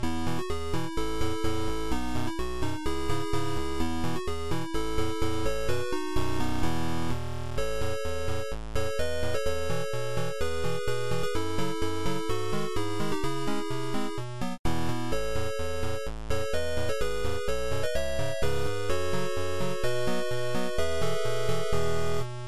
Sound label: 8 Bit; Hetalia